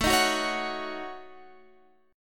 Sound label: A 6th Flat 5th, Guitar Standard, chord; strummed; x,12,13,11,14,14; capo=2